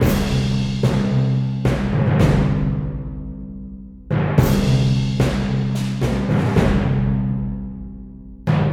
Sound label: sounds music; Halo Combat Evolved; Xbox